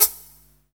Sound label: SHAK, Hip Hop R n B; USB Soundscan vol.19; AKAI